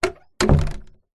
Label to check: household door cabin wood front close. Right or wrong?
Right